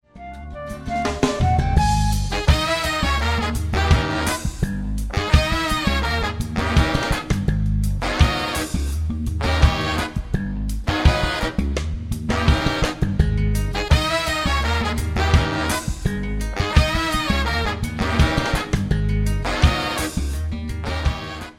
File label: no frills cumbia